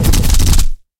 popadanie iz minigana po celi

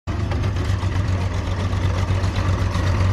traktornaya cisterna

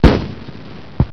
pozhar udar blizkii

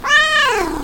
kot myaukaet

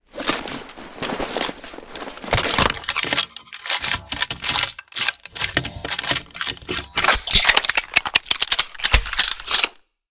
operativnaya podgotovka amunicii k boyu

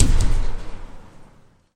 eshe odin hlopok ot zakritiya okna

